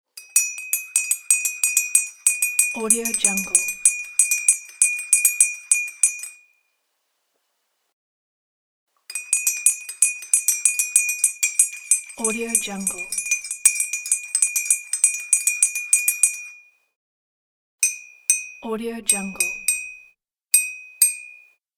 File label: stirring sugar in a cup of tea coffee